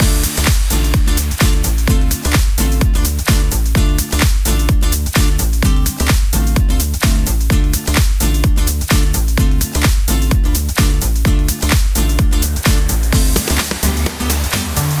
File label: strum; andy vax